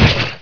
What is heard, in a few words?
BOX DROP; CHASM